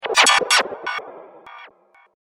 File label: Lively Laser Beam Attack, laser sound; Laser Sound Sound Effects; science fiction sound effect